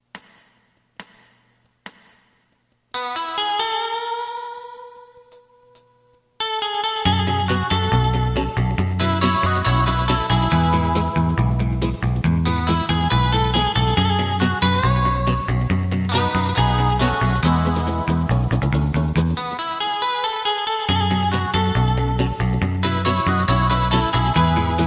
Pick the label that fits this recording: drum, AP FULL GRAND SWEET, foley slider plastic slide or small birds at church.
drum